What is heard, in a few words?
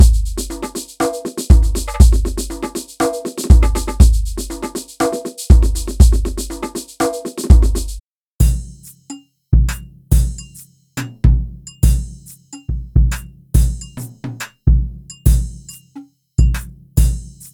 Drum Ethnic; Drum Monkey; Unison MIDI Wizard Plug-In; Drum Demo